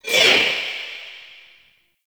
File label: Choir Erupt Proj By